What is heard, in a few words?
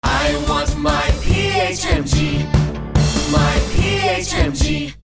Jingle 5 second radio version